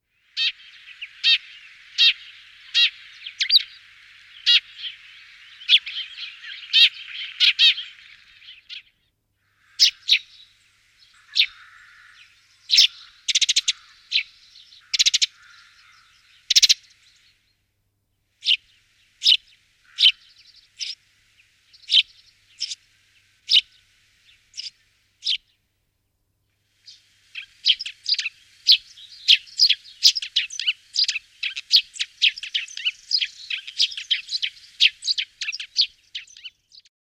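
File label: polevoy vorobey golos, tree sparrow voice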